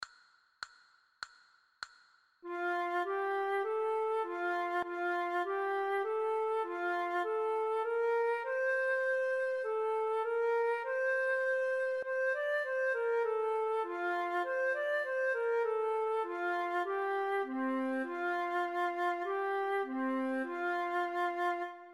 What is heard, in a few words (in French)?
frere jacques, flute a bec